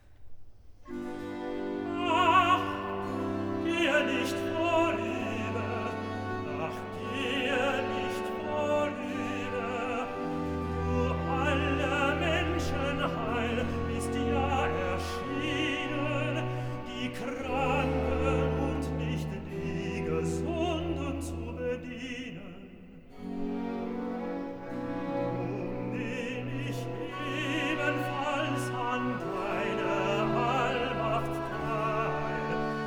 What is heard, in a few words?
Cantata, Recitativo (Tenor) con Choral; BWV 23 'Du wahrer Gott und Davids Sohn'; Bach; Cambridge; Quinquagesima